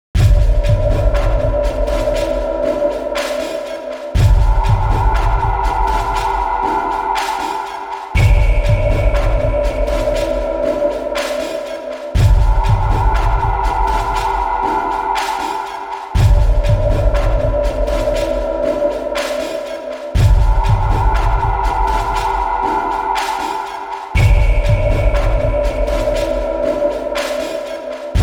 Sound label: Continue; R-Type Original Sound Box